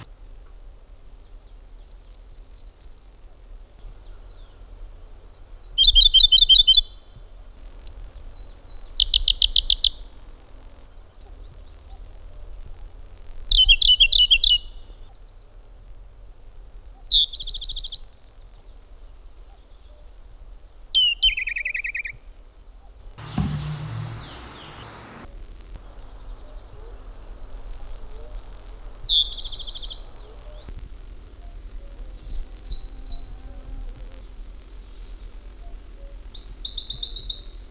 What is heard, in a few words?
Siberian blue robin